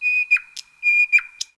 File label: bird